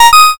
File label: notify